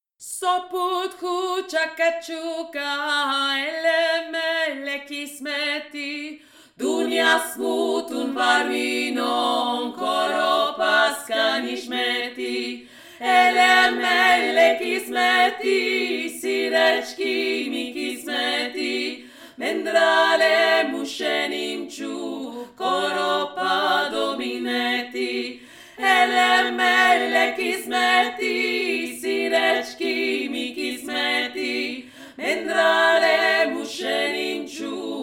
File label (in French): 3 VOIX